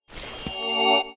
skill heal, mage; HighGroundRoyaleNetcode

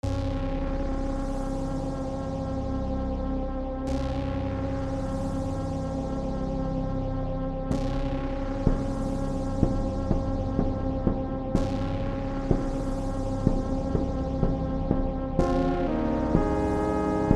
War drums; menu theme

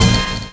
Brass; Feel; Feel Demos; Snake

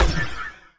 shoot magic; Epic Toon FX

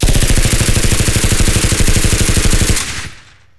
Light Machine Gun Fire; HapticSamples; Weapons; Feel; NiceVibrations

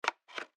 button; Plumber Ultimate

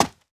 Footstep; Player; High Ground Royale Fusion; Footsteps

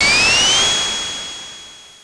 Bounce Charge; Feel

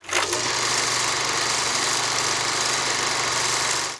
Movie Projector; HapticSamples; SoundFX; Feel; NiceVibrations

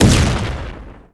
explosion rocket; Epic Toon FX

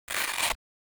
Velcro; HapticSamples; Objects; Feel; NiceVibrations

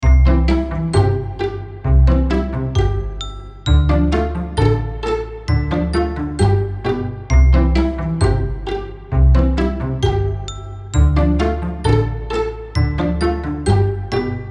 cotton candy children puzzle game music; PlumberUltimateAds

